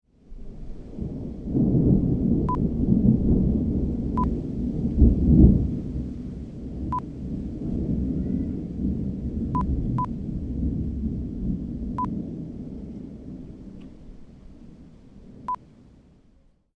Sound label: Distant Thunder